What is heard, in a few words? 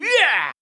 combo, onehand sword; assassin